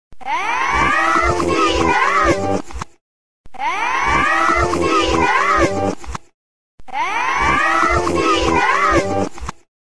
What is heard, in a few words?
reverse; dora the explorer